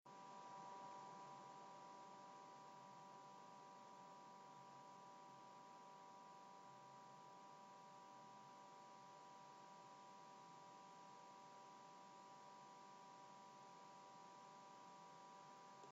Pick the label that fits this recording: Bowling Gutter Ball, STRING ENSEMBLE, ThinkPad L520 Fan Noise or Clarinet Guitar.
ThinkPad L520 Fan Noise